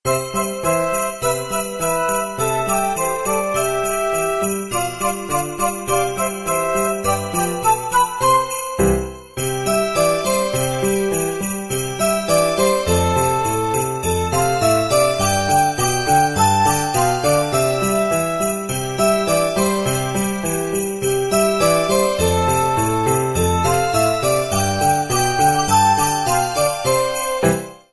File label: jingle bells instrumental version